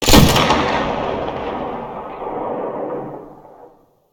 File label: dmr shoot; Tacz1.1.5+; RSWS 1903